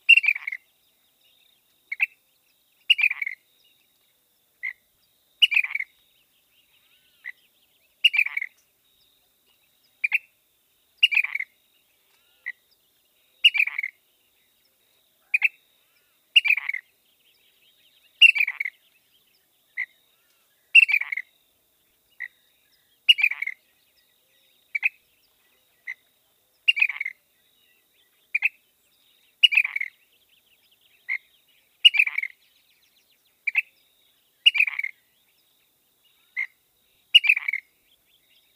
Ash throated Flycatcher

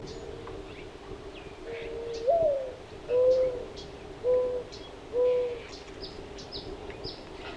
Mourning Dove

